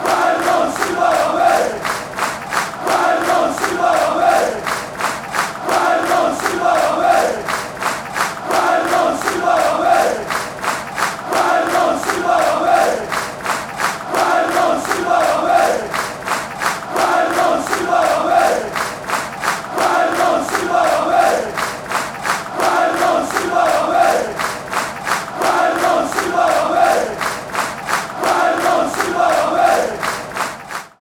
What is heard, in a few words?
super white army